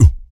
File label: Vocal, Blues & Soul; ILIO; Vocal Planet VOL-2